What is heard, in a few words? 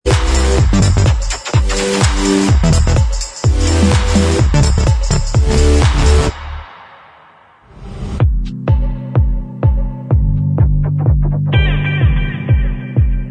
Inst